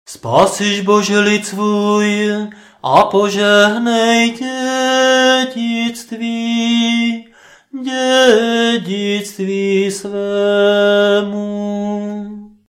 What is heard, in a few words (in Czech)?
Nedelni prokimen hlasu